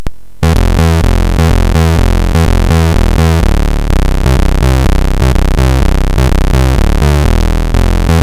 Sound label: loop, SID CSG8580R5; Shitloop 3